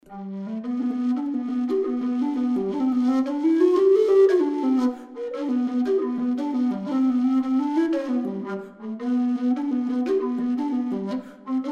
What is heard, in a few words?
Bass G Jig, whistle